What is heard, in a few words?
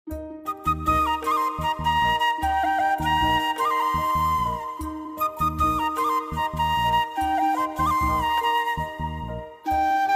flute music instrumental